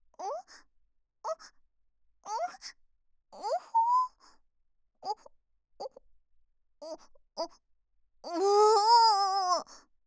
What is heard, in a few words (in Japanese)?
お? おっ おおっ おほぉ…